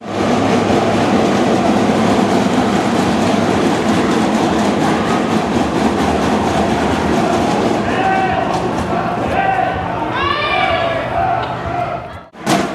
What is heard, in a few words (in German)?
trommeln polizei tiefgarage; Trommeln protestierten die AktivistInnen gegen die polizeiliche Hatz (zusammengeschnitten); Demonstration: „Versammlungsgesetz NRW stoppen! Grundrechte erhalten“